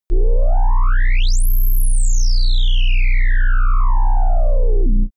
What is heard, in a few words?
engine room